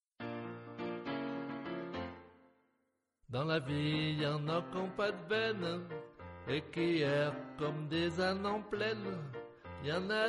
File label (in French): piano